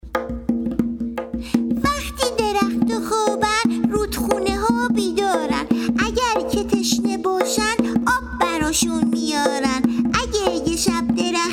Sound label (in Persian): رودخانه‌ها